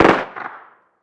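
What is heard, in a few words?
gun miniuzi distant; Call of Duty Modern Warfare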